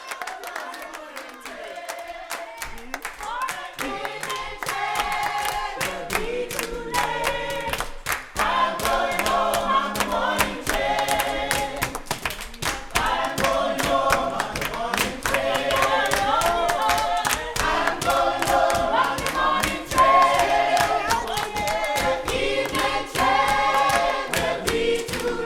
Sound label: Mawnin Train, Mass Choir